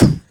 voice bd, Yamaha TG-500

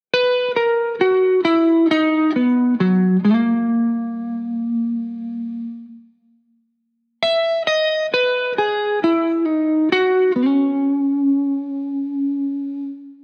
Guitar Bpm135 Key B, 70s Guitars; Fox Samples - Back to the 70s Guitars

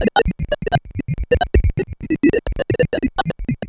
Sh101 Loop, 130BPM

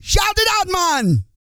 REGGAE, Vocal Planet, World Voices

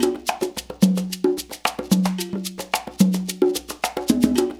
percussion loop; InVision Interactive; Percussion Slam

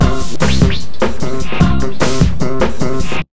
150, Big Beat Drumloops

